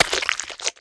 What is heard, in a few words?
HERB STOMP